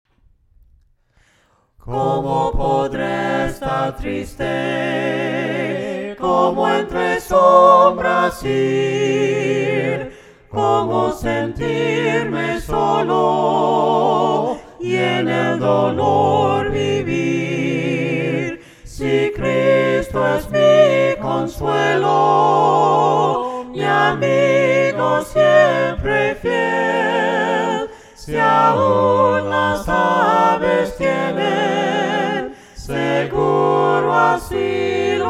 VOCES, himnos a capella; cantos del camino

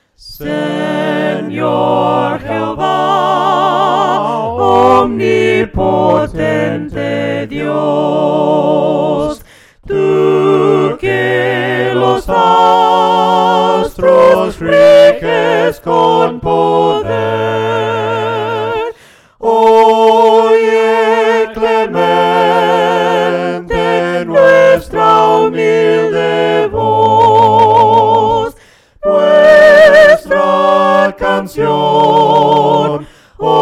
SENOR JEHOVA OMNIPOTENTE DIOS VOCES, himnos a capella; cantos del camino

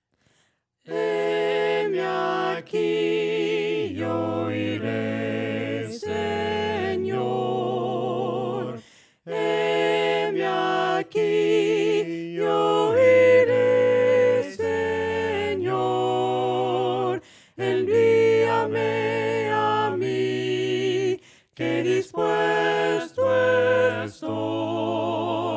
HEME AQUI VOCES, himnos a capella; cantos del camino

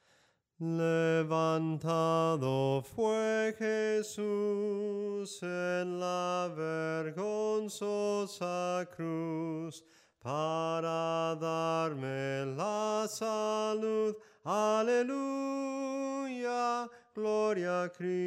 SOPRANO CANTADO; LEVANTADO FUE JESUS

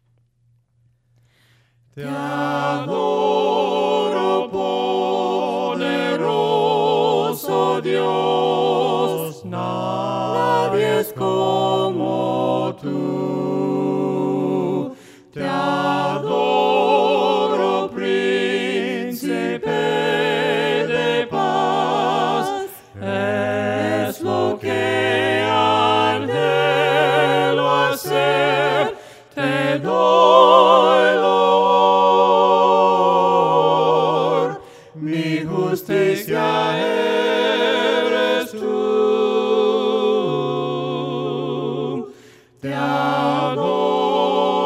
VOCES, himnos a capella; cantos del camino; TE ADORO PODEROSO DIOS

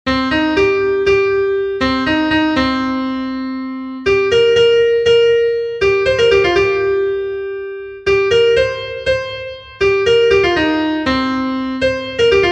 soprano, himnos en piano; cantos del camino

